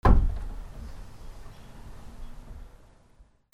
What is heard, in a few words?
WEAPONS